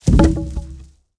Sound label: pole falling; World of Tanks Blitz